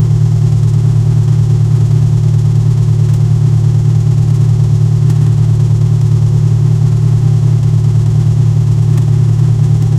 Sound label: seamless loop car engine; automotive